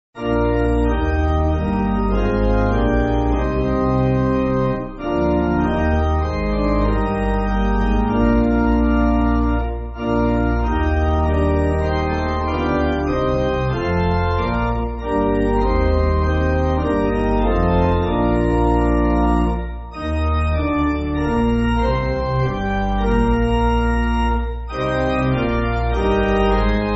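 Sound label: Pipe, Pipe Organ, Music Backing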